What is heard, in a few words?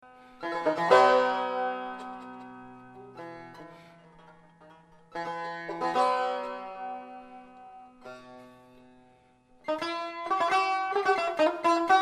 persian tar audio sample